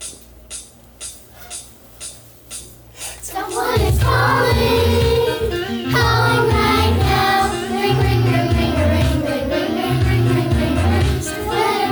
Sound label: ringtone